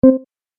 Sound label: Tap Phat Button; Button Sound Effects; multimedia